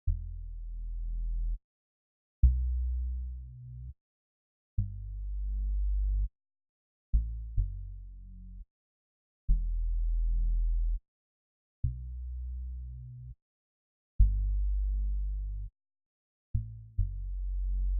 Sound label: 102 BPM B Min Bass; Loop Stems; Cymatics; Infinity Melody Collection; Frustrations